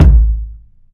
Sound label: warm sounding kick sound A key; kick drum samples